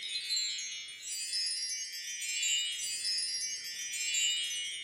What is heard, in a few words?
cookie loop; Dorothy And The Wizard Of Oz - Cookie Magic